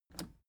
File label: plastic button scratch smooth push disable